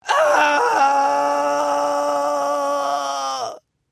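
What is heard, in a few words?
sounds of human suffering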